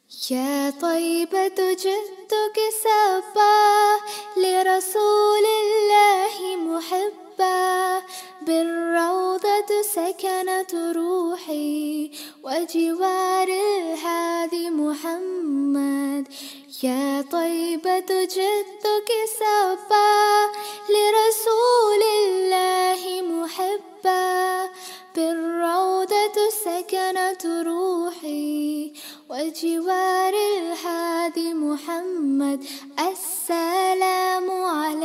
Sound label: Arabic Naat